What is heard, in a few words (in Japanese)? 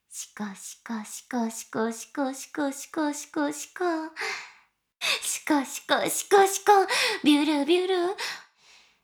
しこしこ音声; 少尉; 牧場戦腺